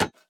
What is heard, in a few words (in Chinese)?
沉重核心踩踏声